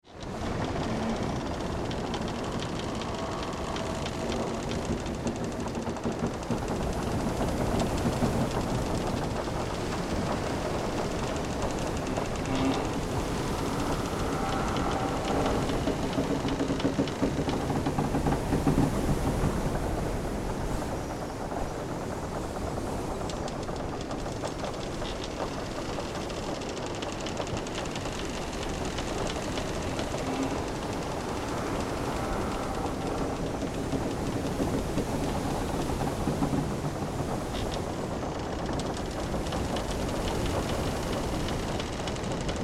Ambience Pirate Ship Ocean Waves Wind Wood Creaks

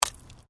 Digging Small Handheld Tool Rocks

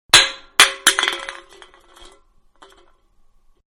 Can Soda-Falling On Concrete; Metal (Sound Effects:Materials); Manhattan Production Music

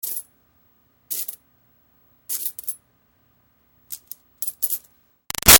Foley Coins Moves Handling; Money; Big Room Sound

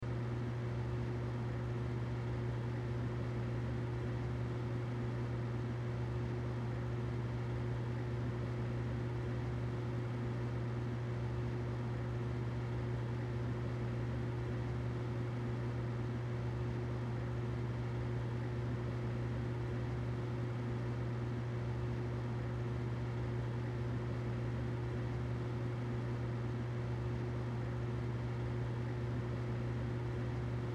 Humidifier Run, Humidifier Run Loop; Appliance; Miscellaneous Household; Blastwave

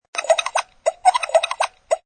Funny Toy Sound; Funny Cartoon Sound 5; Funny little cartoon sound; Children; Human

